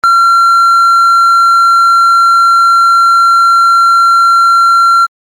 beep

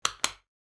handbag lock

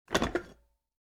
coffee pot pick up; appliances

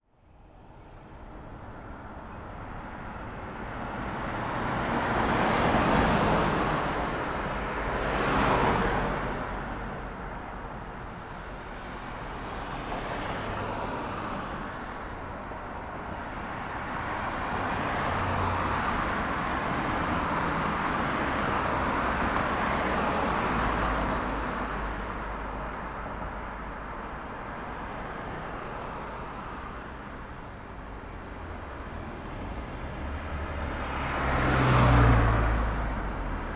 street traffic

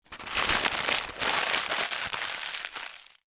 paper rustle

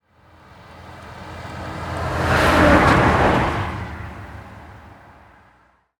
truck pass by; transportation

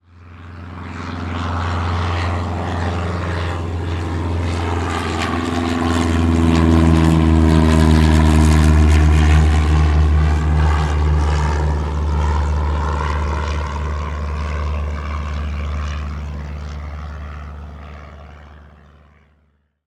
propeller plane lift off; transportation

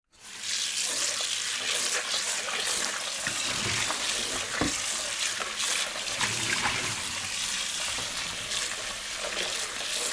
rinsing bowl, kitchen

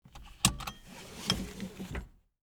seat belt; transportation

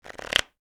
shuffling cards

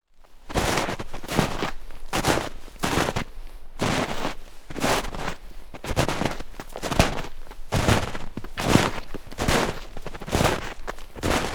walking in snow, footsteps

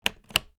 briefcase lock

